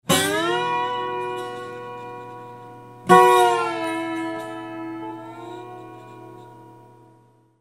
slide

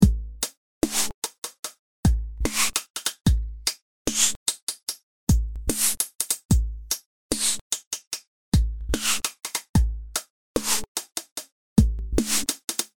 beats

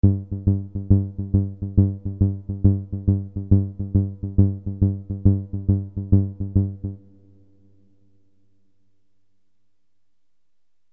bass 138

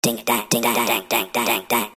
vocals break

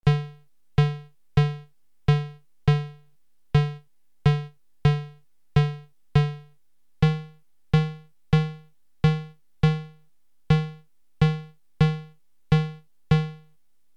dong 138

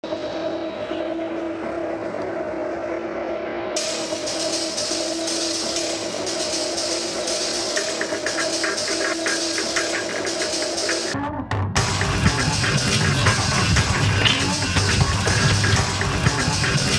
barcelona noise

